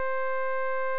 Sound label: pw wave